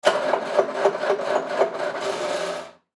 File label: Forklift Start Stall; Machinery; Machines; INDUSTRY; Blastwave